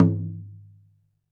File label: Roland TD-20 Percussion